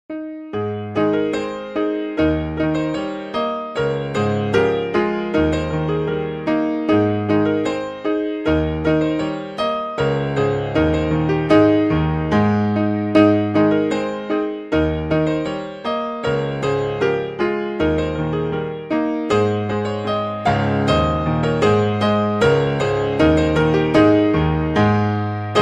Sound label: March Piano